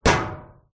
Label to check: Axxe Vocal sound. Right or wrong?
Wrong